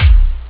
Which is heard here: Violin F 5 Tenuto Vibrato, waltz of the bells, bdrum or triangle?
bdrum